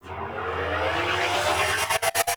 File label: Cart Turret Energy Ball Charge Up; Venus; Turrets